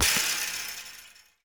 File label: Street Ball Metal Rim Chain, Street Basketball; SFX Kit